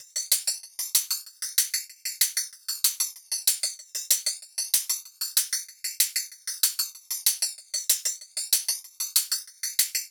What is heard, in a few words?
Synthwave 95bpm Top; Drum Kits; Stems; Ghosthack - Ultimate EDM Drums; Synthwave Kit 07